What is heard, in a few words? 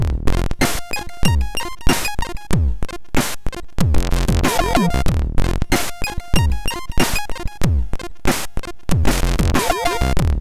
Dancehall sid MOS6581R3